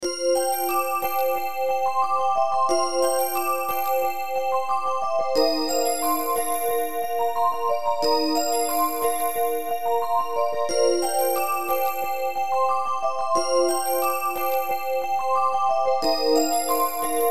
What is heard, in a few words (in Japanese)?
mezame orgel